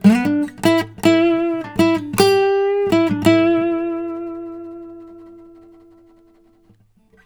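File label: baritone lick E, Blues Guitar; ILIO; Fretworks; 108 BARI LICK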